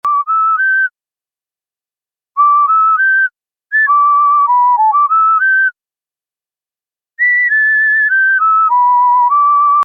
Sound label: panic; Garry's Mod; sprunki tan